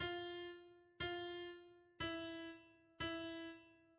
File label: soprano 4 + r4